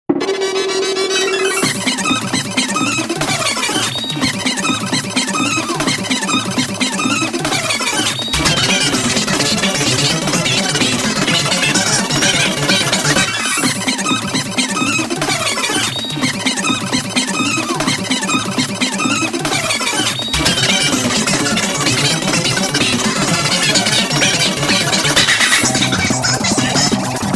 crash

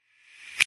switch; reverse

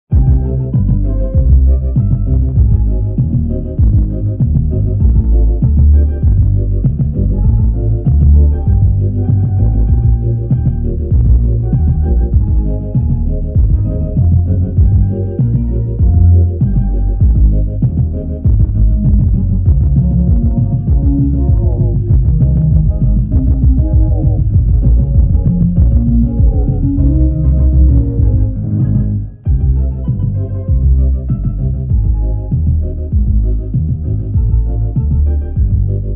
bass

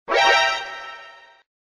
alert

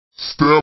step

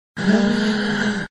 gasp

